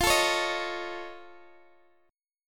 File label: F Augmented 7th, Guitar, Open D; x,x,15,15,16,13